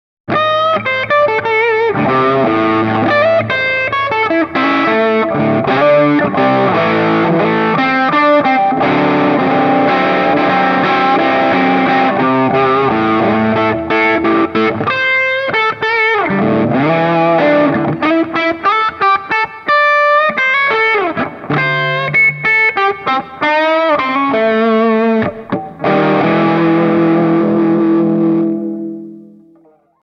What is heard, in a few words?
twango medium gain comp off; Twangophile